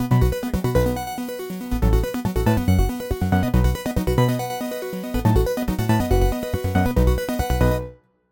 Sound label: arpeggio game logo